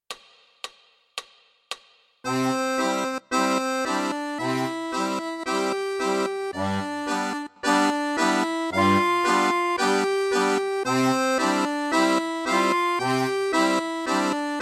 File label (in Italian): Primo Tango; 4° Capitolo - Staccato - Legato; P. Brandimarte